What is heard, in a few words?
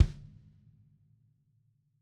Kick In Out